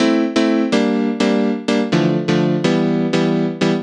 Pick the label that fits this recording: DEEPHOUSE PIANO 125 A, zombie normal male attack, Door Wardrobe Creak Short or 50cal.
DEEPHOUSE PIANO 125 A